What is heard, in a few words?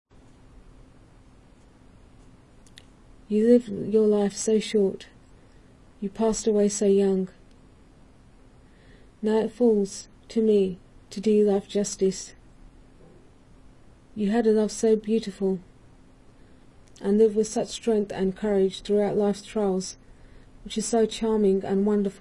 harp